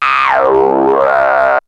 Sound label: ANALOG, SFX; Syntec; Wall of Sounds; TECHNO-BOX